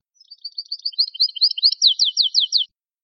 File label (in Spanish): canario; campero